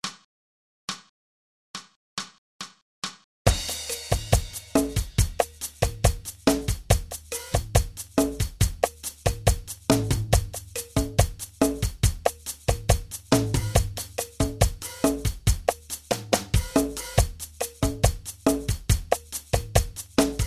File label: Drum Track, Jazz Trombone